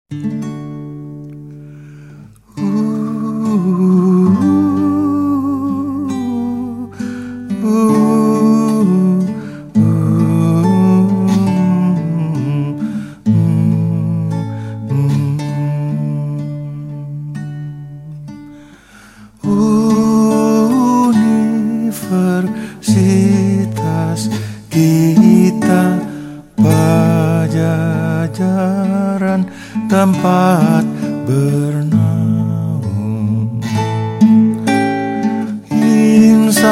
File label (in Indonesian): Hymne; Unpad